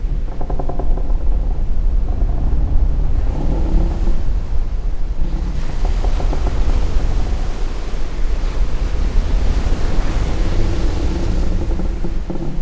Ambient, The Looming Sea

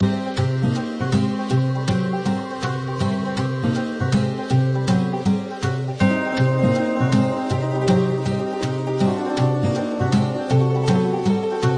Train Area Music Halloween; Hay Day; android

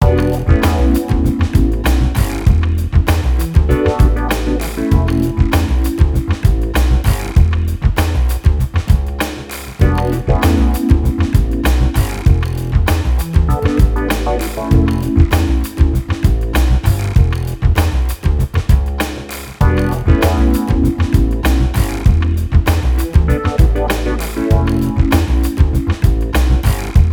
Turbulent Atmosphere; Tutorial Summer

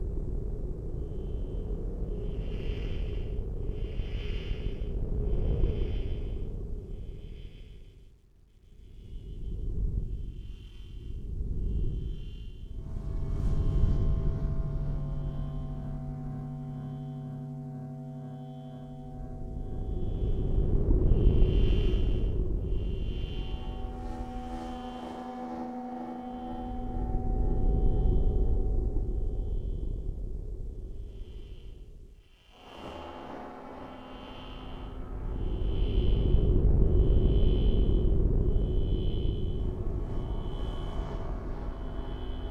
drone

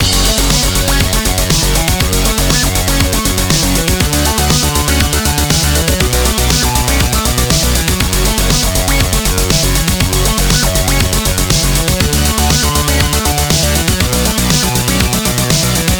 Music Electro; Learn to Fly 3

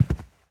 Footsteps Sneaker Carpet Walk; Infernal Radiation

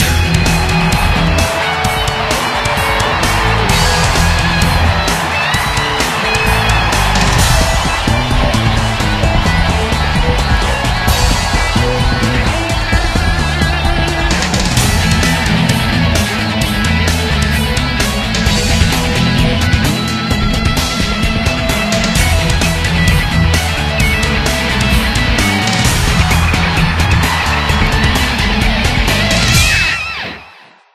Victory Theme; kurt warners arena football unleashed ps1 gamerip 2000

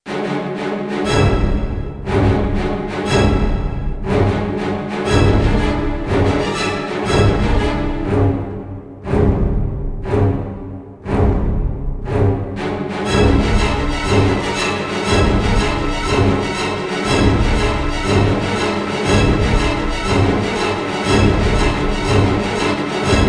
Fight; Kaena; Windows

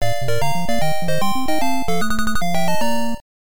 Game Over Music

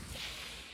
Ladder Climb Down; Among Us

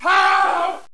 discover civilian

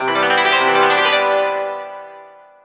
Jingle Race Win; Zenkoku Dekotora Matsuri; wii

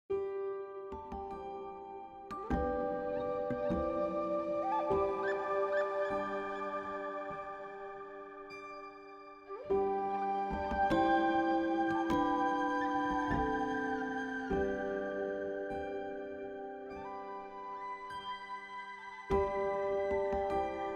Hut Interior Music Box Generic Interior; wellspring Glades

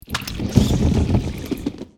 Swarm Inside Wall; silent hill homecoming; ps3

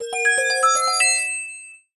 UI Premium Purchase

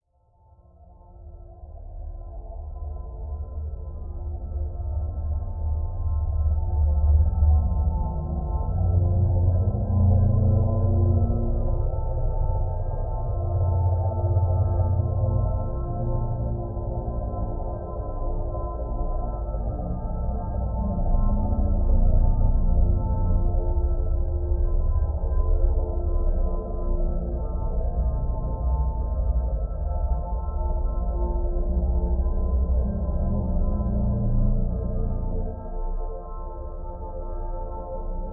Distant Alarms; No More Room In Hell; Windows

